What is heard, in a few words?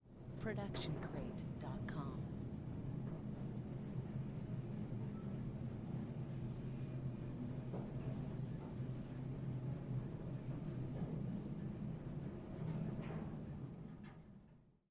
elevator moving